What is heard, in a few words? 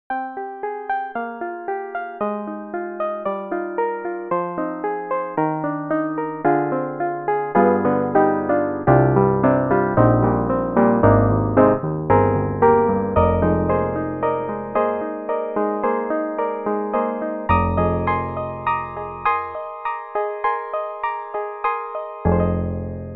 FM Grand Baby Grand; Steinberg HALion 7